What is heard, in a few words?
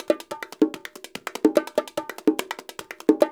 LOOPS 144, Percussion; Sampleheads - NYC Percussionworks